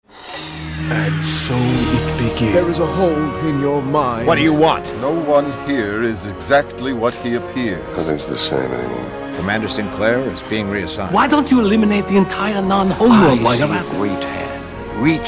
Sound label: Main Titles with Narration